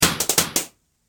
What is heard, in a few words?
Military Snapshot Gun And Drum